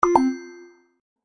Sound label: Positive Pop Remove; Infernal Radiation